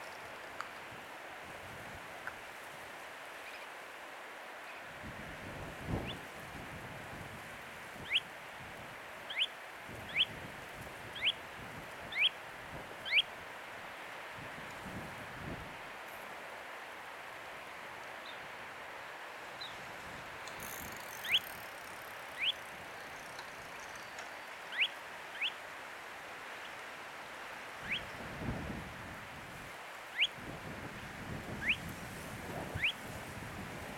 Gravelot à collier interrompu Charadrius alexandrinus

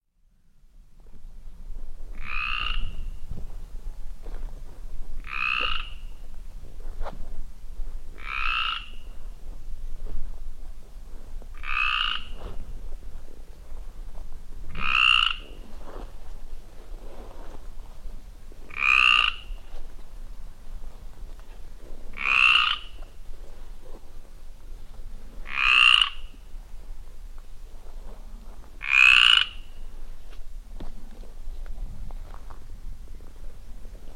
RAINETTE Méridionale Chant Limousin; BRUITX

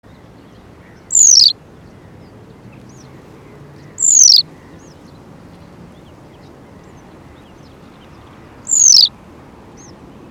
Bergeronnette printanière Motacilla flava

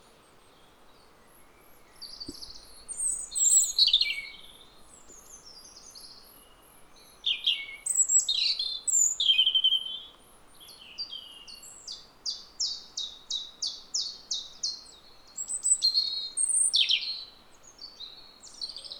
Rougegorge familier Erithacus rubecula

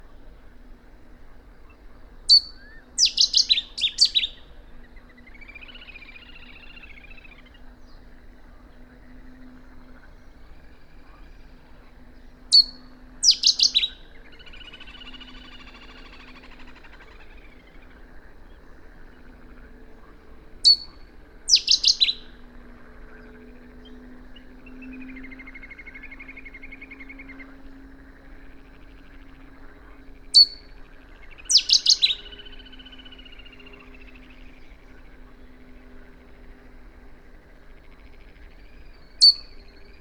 Bouscarle de Cetti Cettia cetti

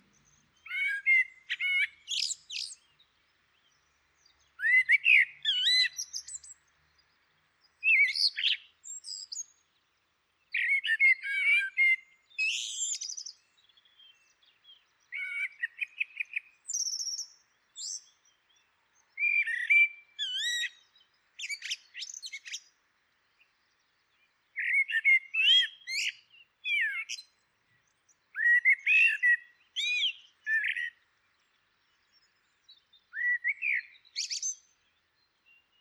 Merle noir Turdus merula

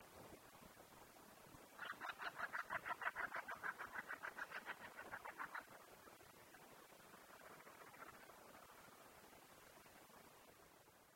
Tadorne de Belon Tadorna tadorna

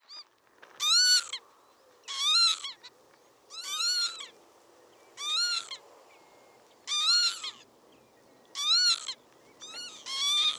Vanneau huppé Vanellus vanellus

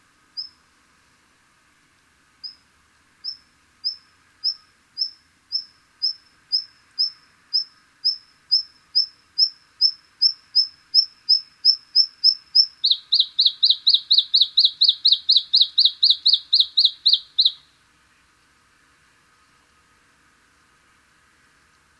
Gorgebleue à miroir Luscinia svecica

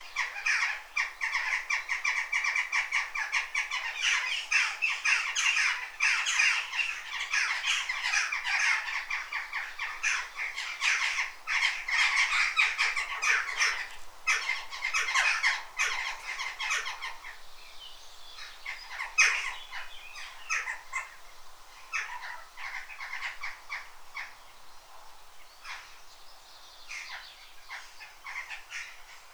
Choucas des tours Coloeus monedula

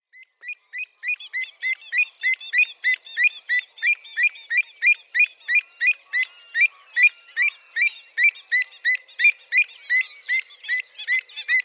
Avocette élégante Recurvirostra avosetta

